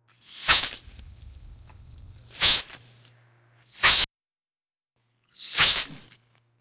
clash of swords sparks; fighting

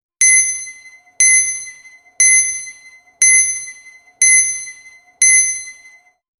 combo milestone sound in, gym

